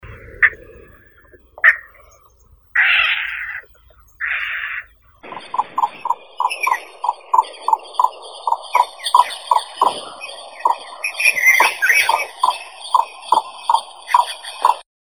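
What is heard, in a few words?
yellow bittern, Ixobrychus sinensis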